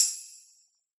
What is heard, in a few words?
PERC R, Drum & Bass 160 BPM; Ueberschall; Drum & Bass Flash Trackz